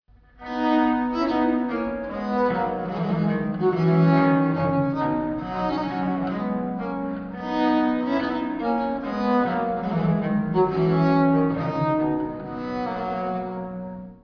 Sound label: gavotte